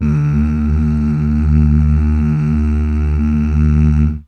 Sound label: MMHH